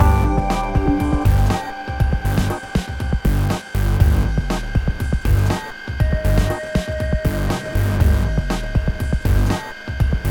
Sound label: loop